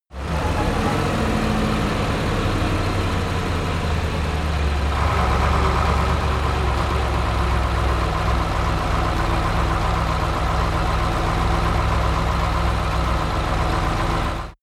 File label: truck ural 4320 decelerate